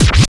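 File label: DOUBLE SCRATCH LONG, SCRATCHES; Drums In Your FACE!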